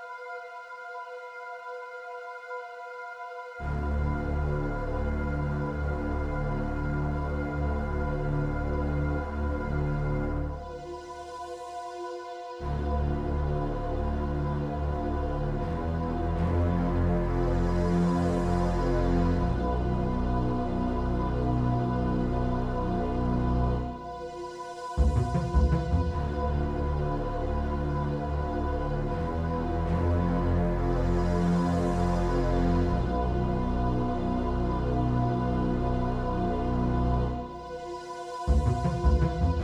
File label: horror music; cyrax